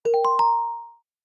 not bad, notification sounds

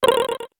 surprise on a spring; funny

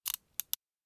wind up, message tones

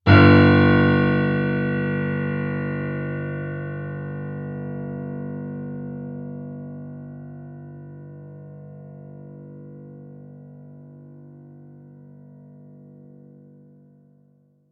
dramatic one note ringtone; notification sounds

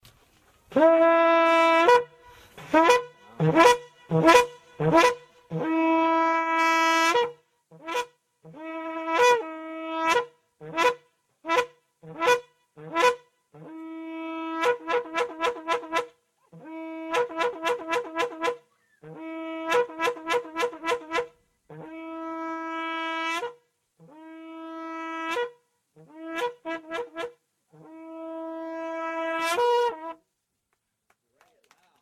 master of vuvuzela

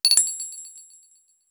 message tones; all cards on table

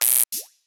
forced out; message tones

